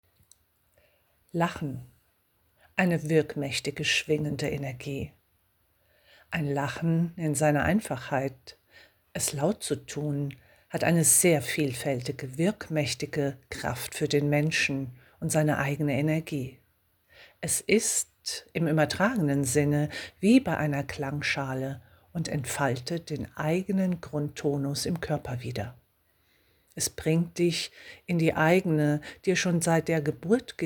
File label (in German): Lachen wirkmaechtige Schwingung; Energie des Lachens; Lachen eine wirkmächtige schwingende Energie